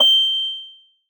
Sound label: soft hit whistle; osu!